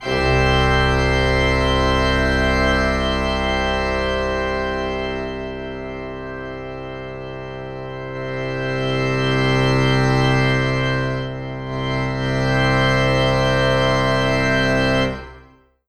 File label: Chord Version